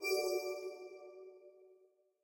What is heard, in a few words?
wild swoosh; berry burst